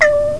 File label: Mine Ping; Asterax